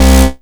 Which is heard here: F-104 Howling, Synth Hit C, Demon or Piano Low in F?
Synth Hit C